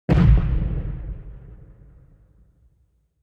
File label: Explosion Large Medium Distant